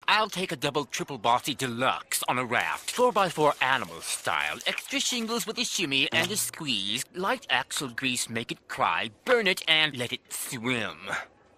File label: bubble bass